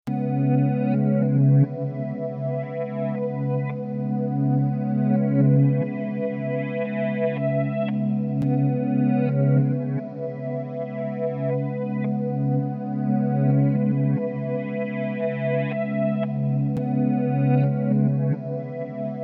C#m 115 Reversed GTR

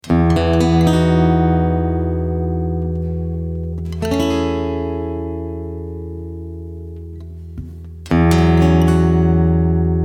E120 GTR chords Loop

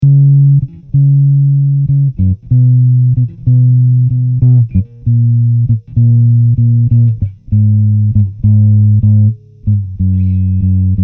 amp Test

